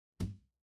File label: impact thud